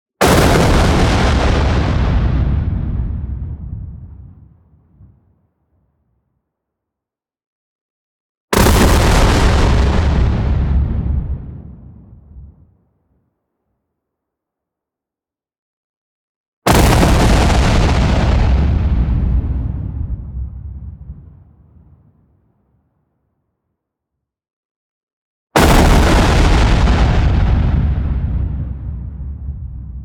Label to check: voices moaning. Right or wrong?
Wrong